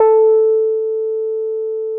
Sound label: BROWN OU A5, Boom da Bass; Boom da Bass 3; E-MU Producer Series Vol. 6 – Analogia Project